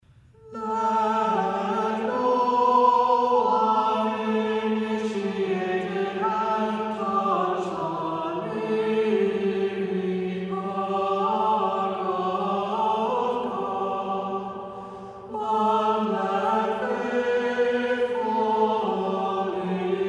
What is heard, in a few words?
Annunciation Irmos; DivineLiturgies